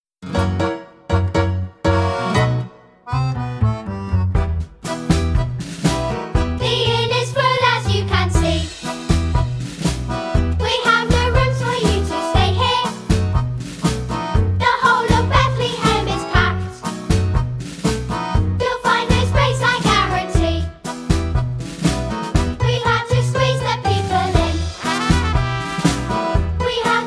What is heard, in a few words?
The Innkeepers Tango (Vocal)